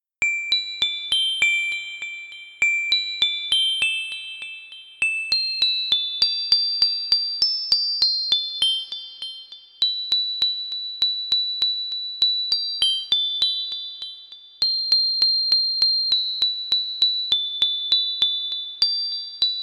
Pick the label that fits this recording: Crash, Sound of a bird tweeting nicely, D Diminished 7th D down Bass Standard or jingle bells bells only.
jingle bells bells only